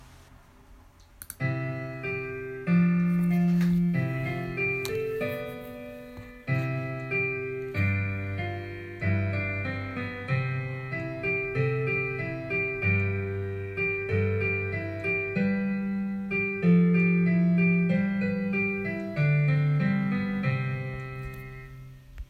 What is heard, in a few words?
Instrumental